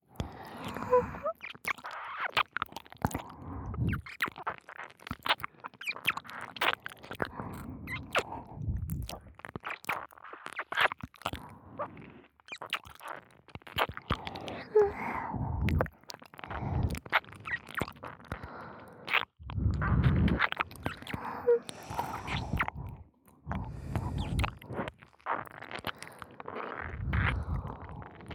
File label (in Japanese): 両耳 舐め